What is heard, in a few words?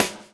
DOWN, DOWN KIT 78